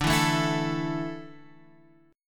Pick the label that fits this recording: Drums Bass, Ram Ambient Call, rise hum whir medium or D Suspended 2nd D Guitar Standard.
D Suspended 2nd D Guitar Standard